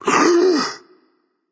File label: nv breath; smite; voicepack; xbalanque